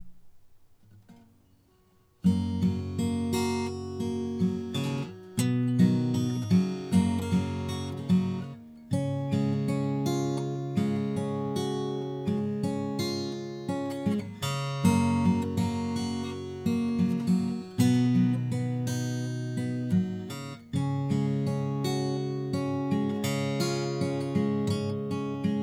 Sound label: pick left, hsguitar